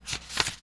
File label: page para